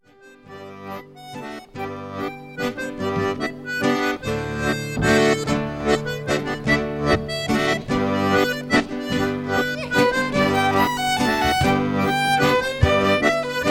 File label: mazurka